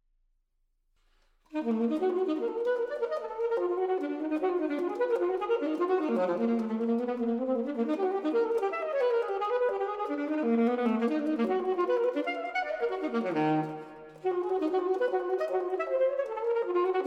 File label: saxophone performance